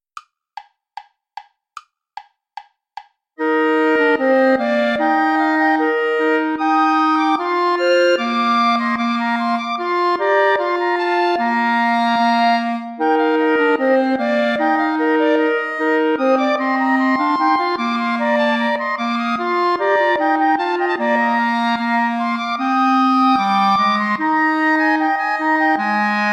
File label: ballet play along without clarinet; praetorius michael; ballet iii